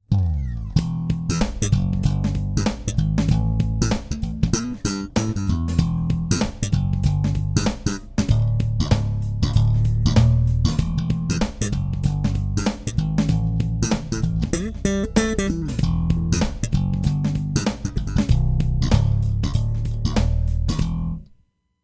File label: Act Slap both; Slap on the Mosaic Std 5, both pickups in active mode; Line 6 Bass PodPro